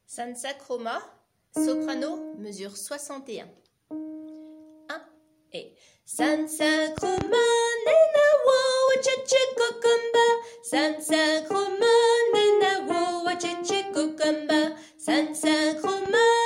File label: soprano